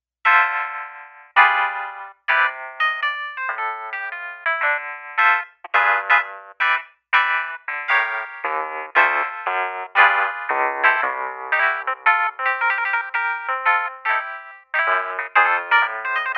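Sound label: Piano ROAD RADIO, synth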